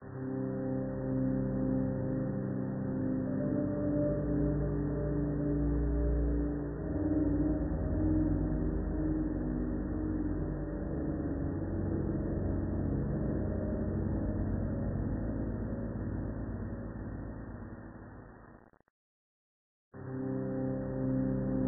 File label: bgm